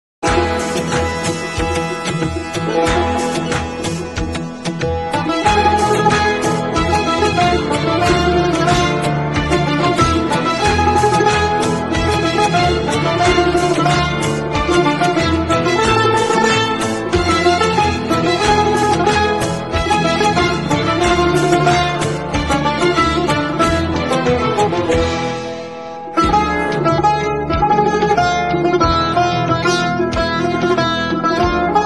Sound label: Tar